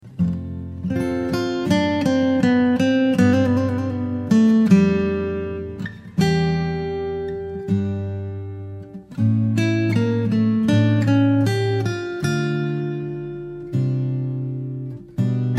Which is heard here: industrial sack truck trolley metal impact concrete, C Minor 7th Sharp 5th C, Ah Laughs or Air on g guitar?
Air on g guitar